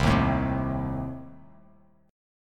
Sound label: C Minor 6th; Cm6 strummed; JGuitar